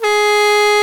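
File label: G#2 SHENG, CHINESE SHENG; AKAI S6000 CD-ROM - WorldSounds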